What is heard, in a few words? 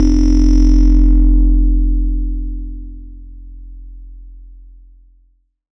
bass F1, PPG Wave 2.2